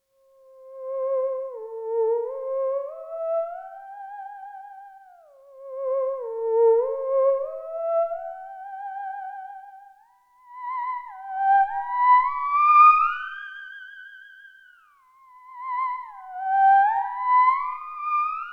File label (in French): Jingle Thérémine; Peter theremin